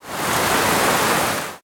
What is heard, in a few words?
ambient battle; World Of Warships